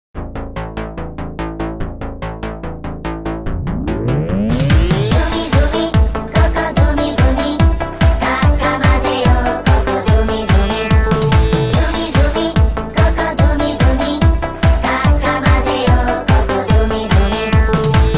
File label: Karaoke Version; Vodafone Zoozoos IPL 2013